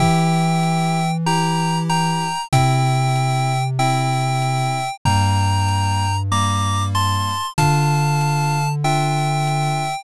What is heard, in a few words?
bgm mellow